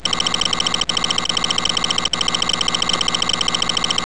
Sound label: beep, chinook